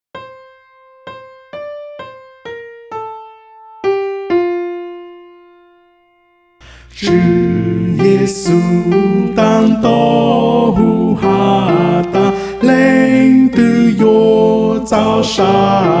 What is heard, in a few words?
soprano